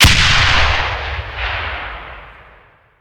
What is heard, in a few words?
Grenade Launcher, Heavy Blast; gun and weapon sounds; captain audio; fully loaded